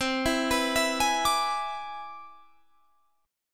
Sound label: C Augmented Major 9th C down Guitar Drop D